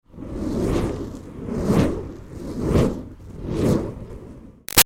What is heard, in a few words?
Fire Torch Whoosh Spinning Med; Flames